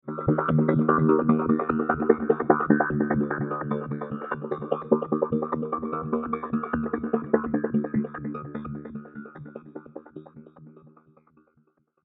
Sci Fi Pad; Science Fiction Sound Effects; star wars / trek style games

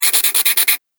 Pencil Scribbles On Paper; Writing Sound Effects; Foley Sound Effect

